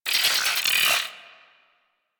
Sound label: Robo Fish Medium Caught